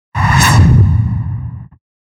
Whoosh

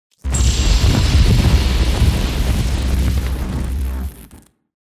Thunder element

